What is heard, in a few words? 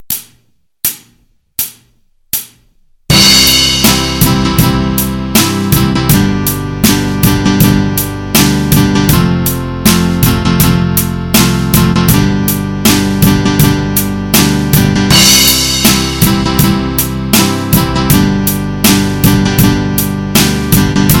jam track for c major scale, guitar